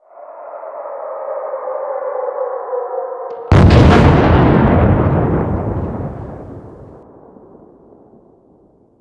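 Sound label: incoming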